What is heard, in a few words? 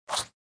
Chomp Sound; sushi cat